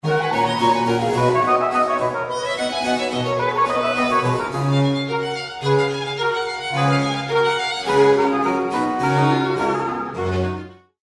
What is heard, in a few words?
telemann tafelmusik quartet in g major; 1733